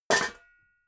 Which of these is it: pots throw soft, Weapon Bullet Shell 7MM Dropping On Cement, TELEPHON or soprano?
pots throw soft